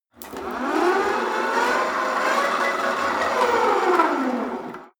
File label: rope trainer pull sequence fast; sport freizeit; fitnessstudio